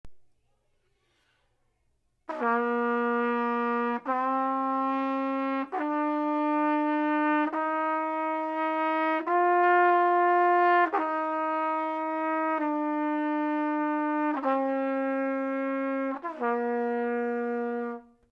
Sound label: trumpet